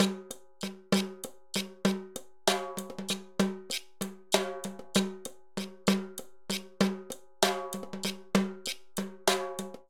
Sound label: Danzon 130bpm, Latin Percussion; Ableton; Factory Packs